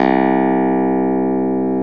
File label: CLAV C 2; Roland - LA Composer vol. 1